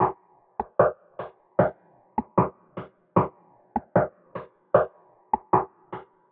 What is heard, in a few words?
Percussion Loop - 152 BPM, Hip Hop